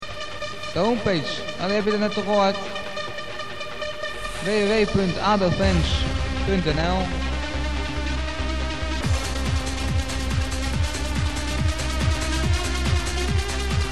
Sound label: techno